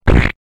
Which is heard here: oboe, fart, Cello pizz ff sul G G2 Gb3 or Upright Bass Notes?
fart